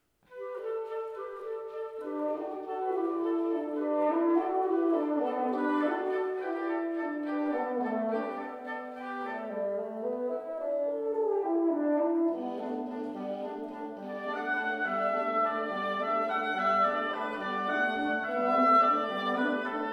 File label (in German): Waltz, Bläserquintett; QC Konzert